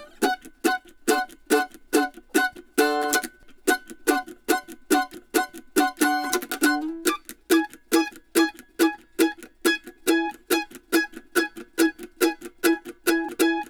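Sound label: Country Mandolin Chorus; Chorus Sec 3; Digidesign; Pro Tools Essential Loops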